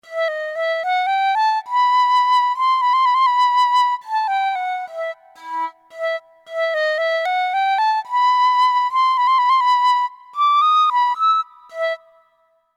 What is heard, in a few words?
Waveguide Flute; Pat Scandalis